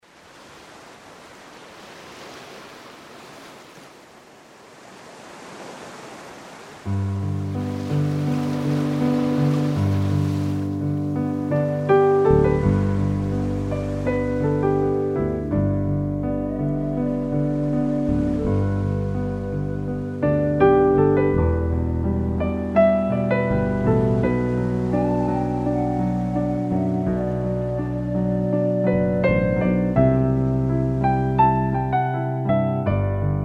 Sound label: Piano